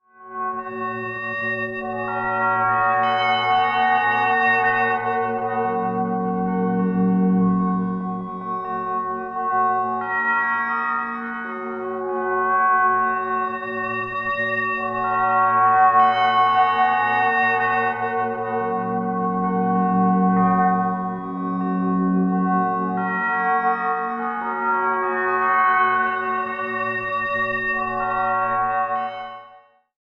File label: Folding Bells